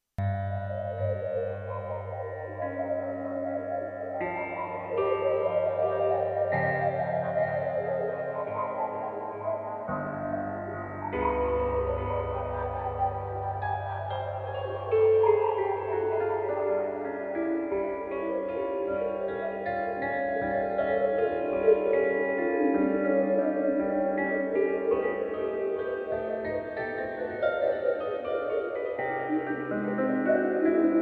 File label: juno g internal patch faked piano